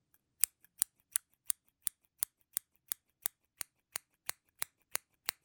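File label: scissors sound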